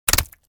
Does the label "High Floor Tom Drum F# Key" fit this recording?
No